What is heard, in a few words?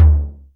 TOM MD; Back In Time Records; Big Bang